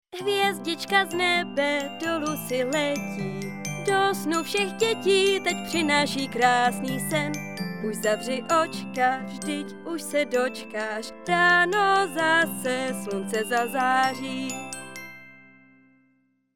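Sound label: Female Czech sing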